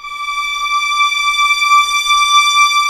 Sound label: violin D5; AKAI S-Series CD-ROM Sound Library VOL-2